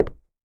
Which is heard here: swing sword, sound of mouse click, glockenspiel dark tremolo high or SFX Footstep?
SFX Footstep